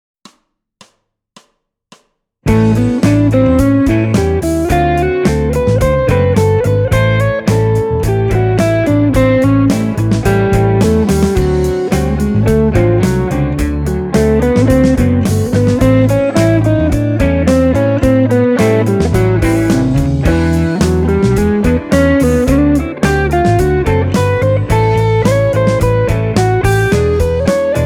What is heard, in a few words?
blues